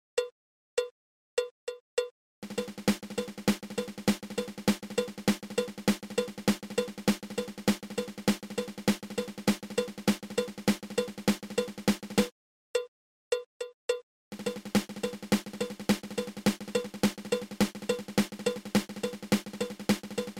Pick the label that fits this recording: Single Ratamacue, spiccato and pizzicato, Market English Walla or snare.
Single Ratamacue